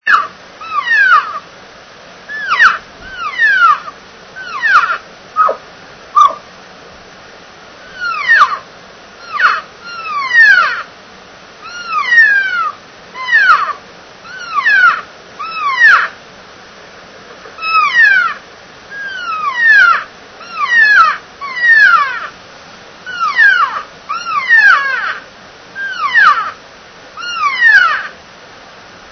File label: cow, elk; elk 2001